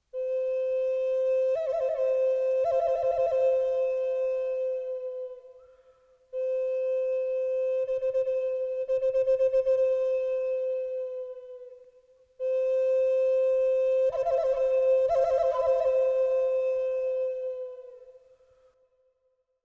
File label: indianflute